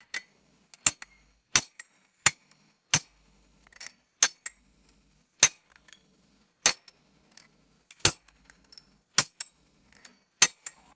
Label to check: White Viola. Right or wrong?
Wrong